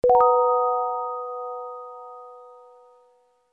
heal; easyrpg; WitchsHeart

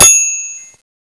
bell; easyrpg; Ib

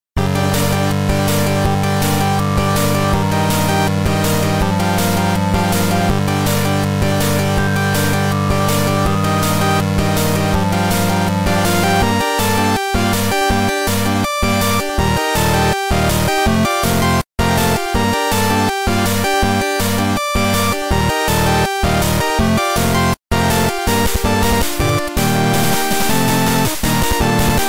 chiptune version

